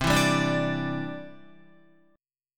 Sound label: C Major Add 9th, Guitar, Cadd9 chord